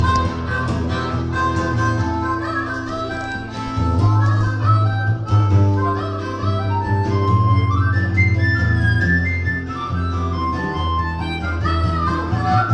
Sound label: harmonica masterclass